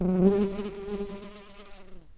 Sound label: Pascuorum workers, bumblebees